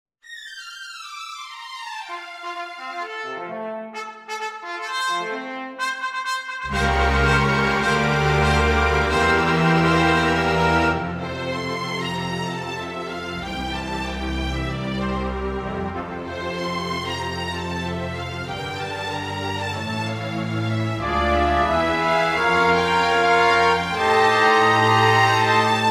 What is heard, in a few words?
Fanfare orchestral version